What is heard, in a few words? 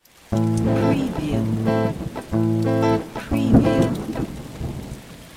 Rhythm 6-8 Slowrock Am 120bpm No Effect, electric guitar; beatcraftpro